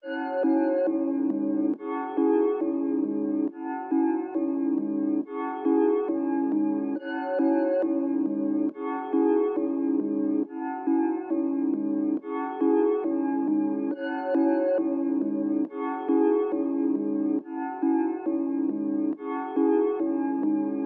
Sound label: 138 BPM C# Min Bells, Loop Stems; Cymatics; 2022 Melody Mystery Expansion